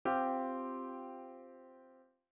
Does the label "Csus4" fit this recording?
Yes